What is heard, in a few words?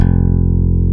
YK BASS F-1, Bass Guitar; AKAI S6000 CD-ROM - Volume 1